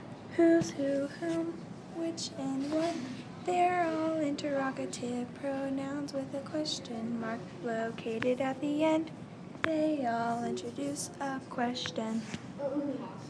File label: interrogative pronoun song